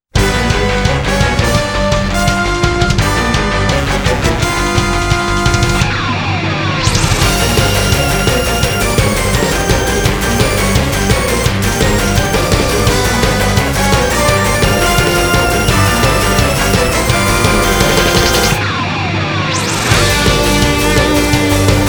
Battle BGM

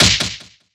Party Bad

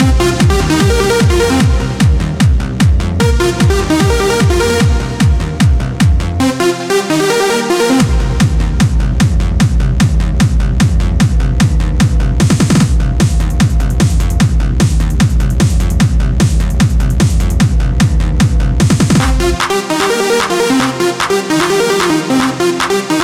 BOSS THEME; PUGLIPSE NOW

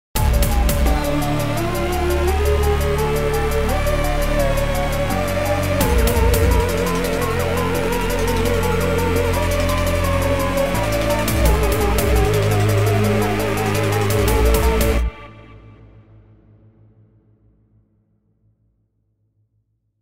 Booty Riser; Outcore: Desktop Adventure

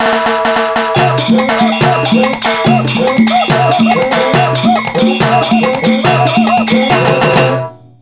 SAMBA; Super Mario Maker

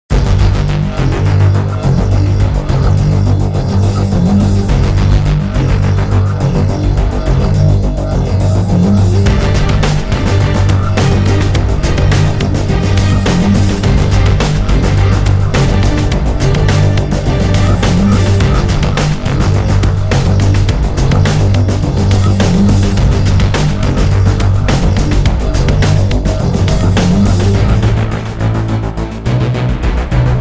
Emergence Theme Heavy Orchestra Choir version; sin episodes emergence original soundtrack

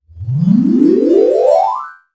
Jingle EVENT; Puzzle Series Vol. 5 Slither Link

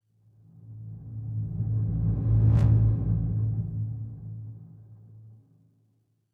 Music Tension One Shot; Battlefield 3

